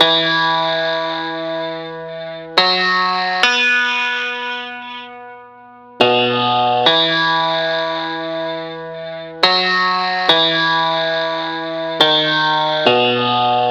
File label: piano